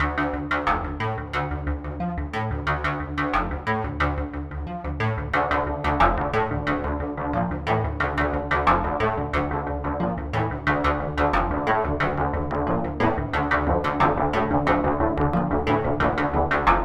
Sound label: Increasing Drama